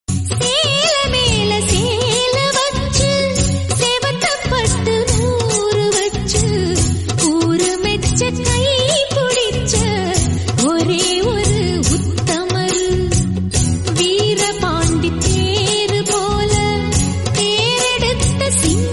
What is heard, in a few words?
old song tamil